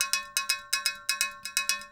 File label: PERCUSSN ETHNO 125, Drum